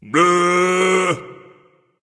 tongue, voice; Smite; ymir skin12

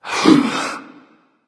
breath; smite; agni

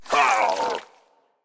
minion death grunt; smite; npc; roman archer

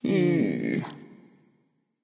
tongue; smite

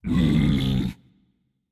tongue, voicepack; smite; jorm

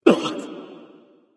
grunt hurt; smite; thanatos

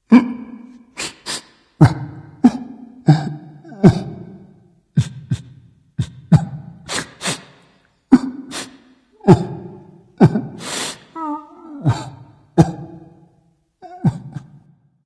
boohoo dragon; fafnir

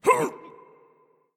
hitreact human male small

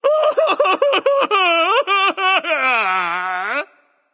boohoo; Smite; Horus

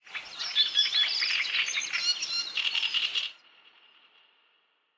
ambience bad birds; smite; conquest

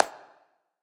clap; smite; god thanatos

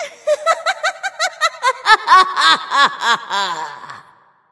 laugh; smite; hel

